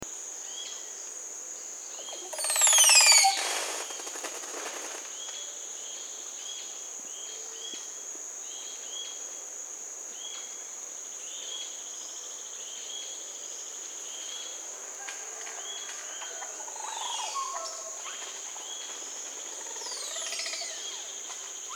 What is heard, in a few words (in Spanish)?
cacique crestado psarocolius decumanus